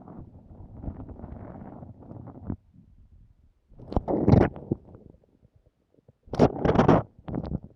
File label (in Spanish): toma mate